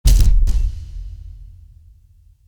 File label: drum kick; kit